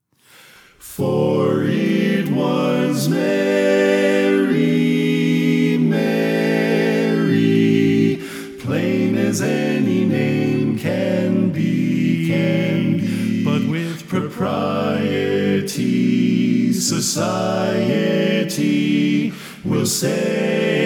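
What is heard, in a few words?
Baritone Left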